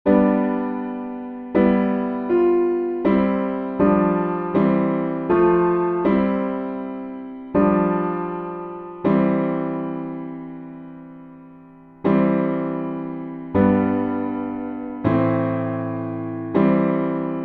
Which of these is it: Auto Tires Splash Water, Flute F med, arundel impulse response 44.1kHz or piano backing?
piano backing